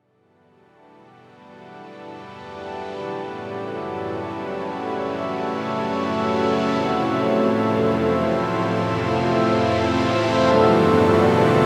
Spa Music Relaxation

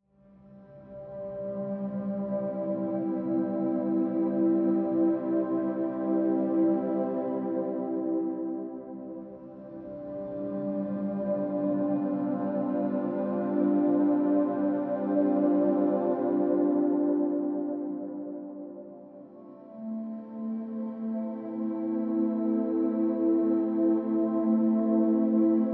Nature Sounds Nature Music

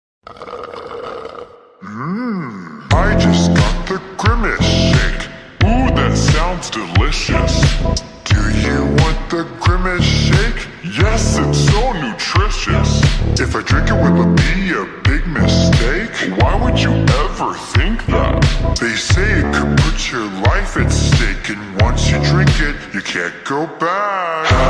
Slowed Reverb

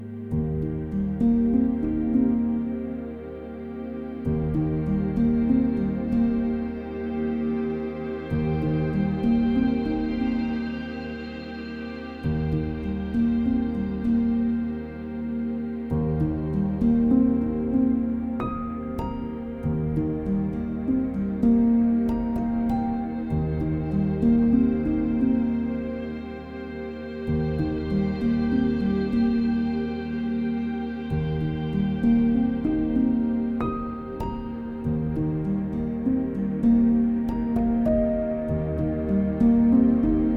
Yoga Workout Music Chillout Lounge

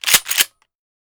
Mossberg M500 Pump Action Shotgun Pump Action; Sound Ideas

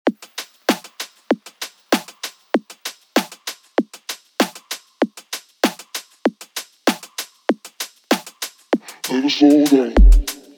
Low Bass, 37-41 Hz

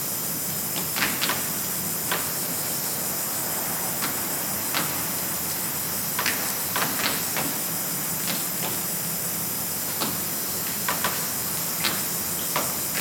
Night Droplets

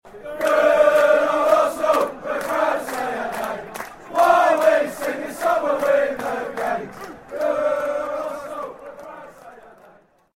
Good Old Arsenal chant; Arsenal FC

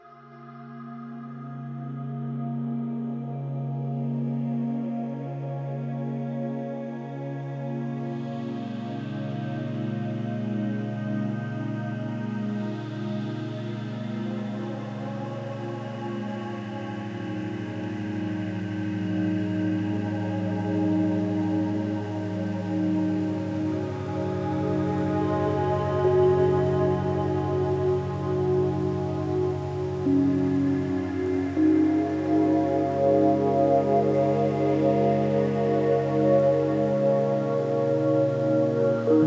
Peaceful Piano

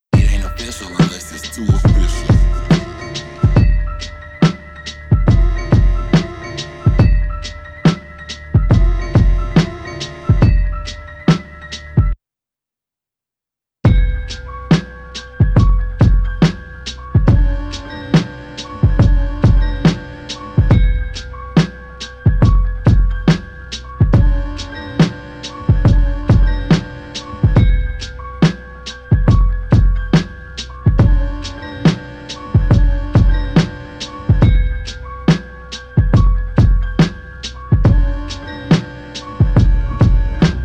Instrumental

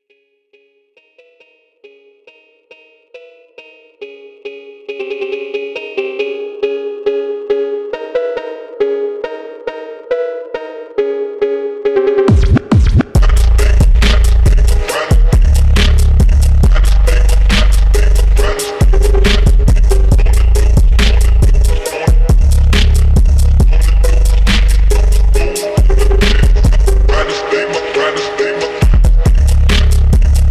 Slowed Reverb

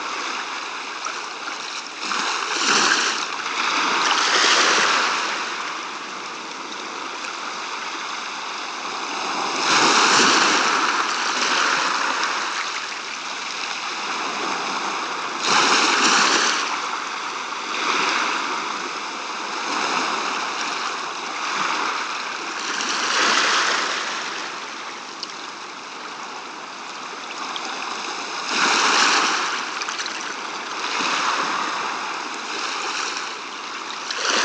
Gentle Lapping Waves

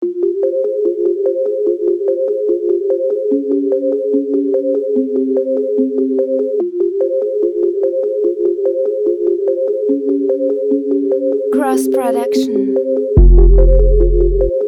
TRAP TYPE BEAT

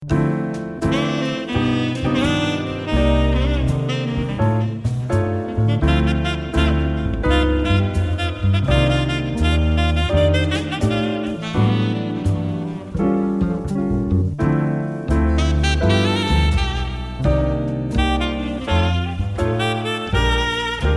Sax Solo

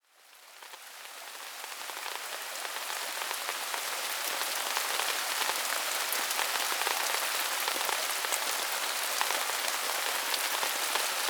Rain Sounds Nature Sounds Rain Sounds

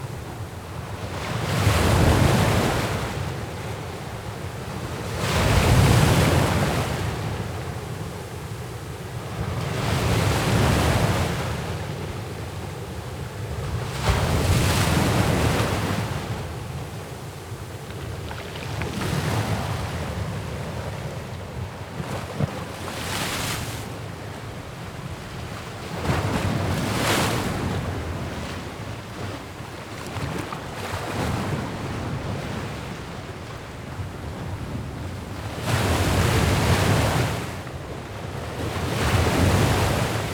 Rain Sounds - Waves Shore Rugged Water; Relaxing

